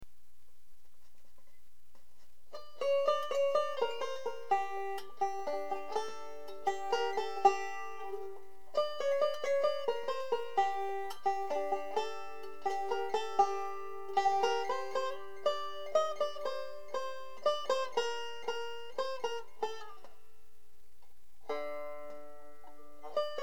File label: classical, banjo